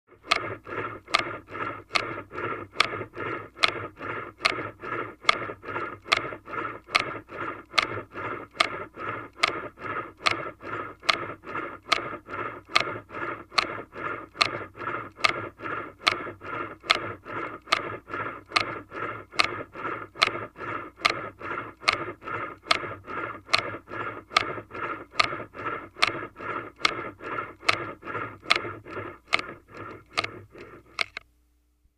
Heart Simulator; Interior, Muffled, Run Reverse, Steady In / Out Breathing With Light Bellow Inflate / Deflate; heartbeats